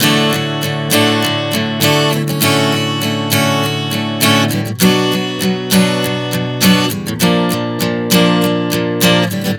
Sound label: D-Dmaj7-Bm-Bbmaj7 100 bpm, acoustic guitar chord progressions